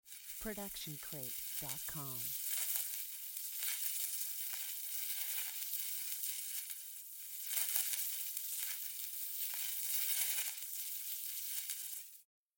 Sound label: Magic Portal; SoundsCrate